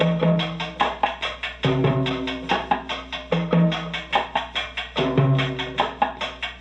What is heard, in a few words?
Chamberlin Rhythmate Loop, Drum Machines; Chamberlin Rhythmate Sample Pack; 300 Drum Machines